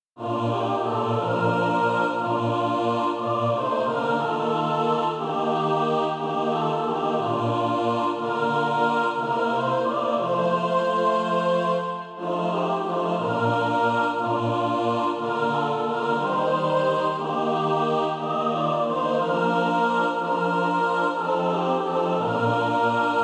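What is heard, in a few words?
mixed, SATB Practise Music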